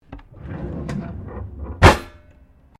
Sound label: Drawer Interior Wooden Kitchen Large Saucepans Open; Various domestic drawers; Household